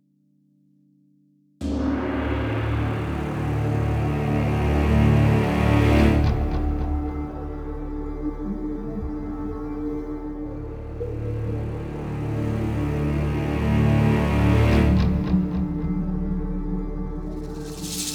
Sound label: Monster Hunting; The Witcher 3 Wild Hunt; Skellige